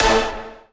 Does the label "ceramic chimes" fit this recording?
No